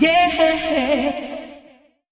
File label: "yeah" sung